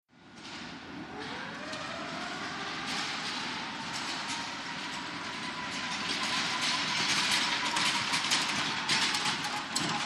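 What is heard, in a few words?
Train Station Electric Baggage Car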